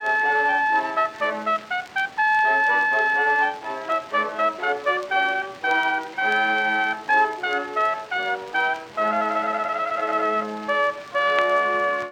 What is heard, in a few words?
The trumpet shall sound; Messiah; loc-edison